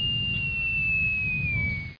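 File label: bomb drop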